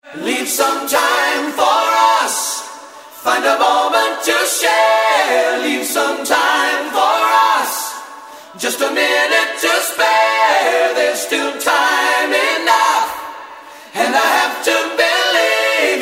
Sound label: vox, acappella